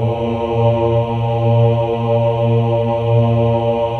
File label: OH A#2, CHOIR OHS; Spectrasonics; Symphony of Voices